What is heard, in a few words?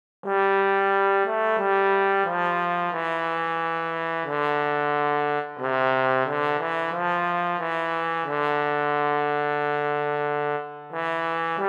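solo trombone; Alle Jahre wieder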